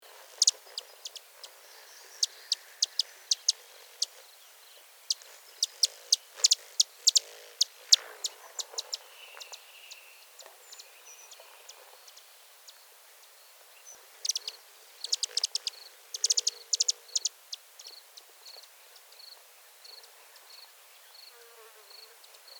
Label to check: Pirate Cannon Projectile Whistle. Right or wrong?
Wrong